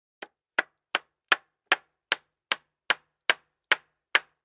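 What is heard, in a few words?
2x4 Hammer Nail On Cement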